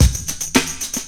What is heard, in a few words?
0.5 BER RHYTHM